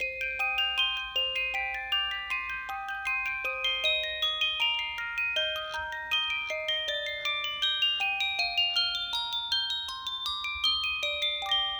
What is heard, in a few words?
Musicbox; l. o. l. lack of love 2000 dreamcast gamerip